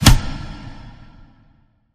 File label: Serve Smash Swipe